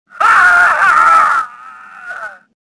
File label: death; Star Wars Jedi Knight II Jedi Outcast; XBOX; stofficer